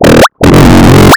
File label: disto fx, big beat